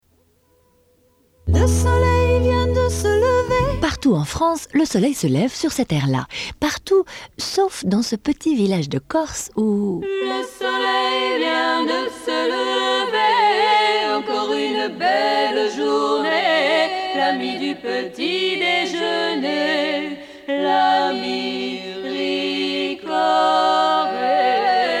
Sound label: Polyphonies Corses; Ricoré